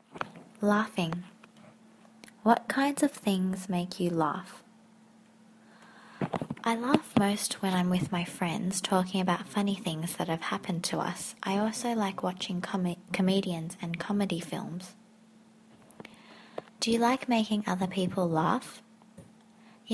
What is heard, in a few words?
Laughing; 雅思; 口语音频